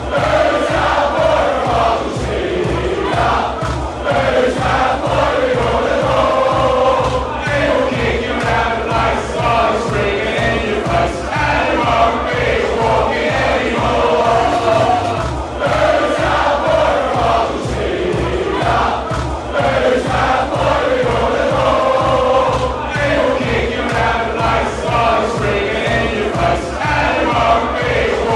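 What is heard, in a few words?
player chant tottenham, football chants; cristian romero; united kingdom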